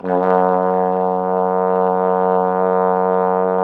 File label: trombone; Sound & Vision - Gigapack I CD 2 (Roland); Brass&Woods2